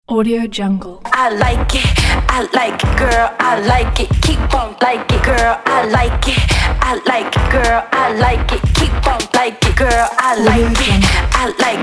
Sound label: aggressive sexy dance